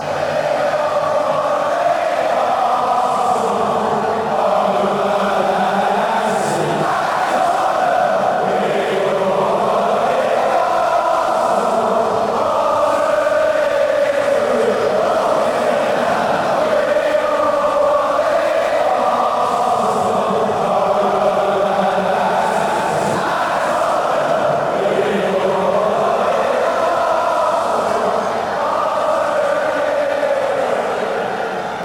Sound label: arsenal chant, chants; massive